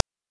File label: PITCHED NOISE; NES DPCM; MIDINES